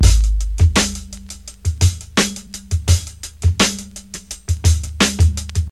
84 bpm high quality hip hop breakbeat sample g sharp key, Free drum loop; drum loops